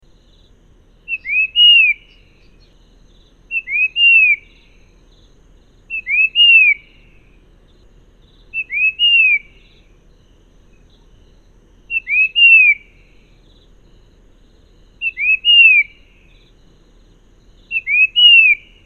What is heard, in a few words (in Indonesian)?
panggilan cipo